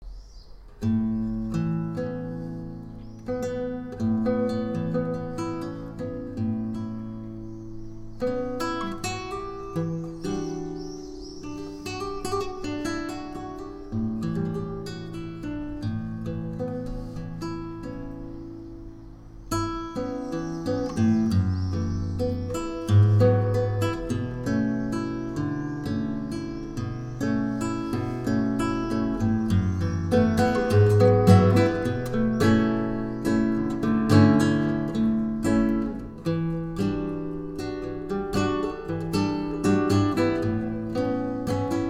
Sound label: guitar